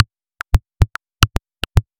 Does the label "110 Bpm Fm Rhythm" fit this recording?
Yes